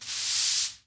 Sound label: SWEEP; mahlendur